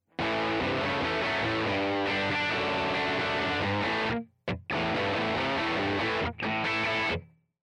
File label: dirt jump, guitars